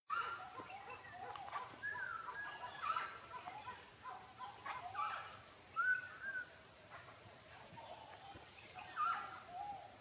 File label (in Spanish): pajaros